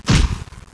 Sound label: land; svencoop